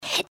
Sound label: breath; pony; Twilight Sparkle